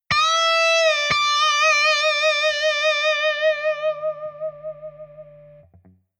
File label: Midi Bend